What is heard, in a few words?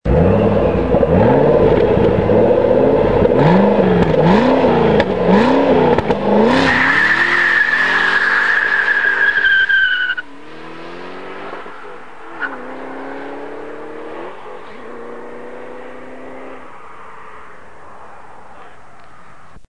gt500 sound, mustang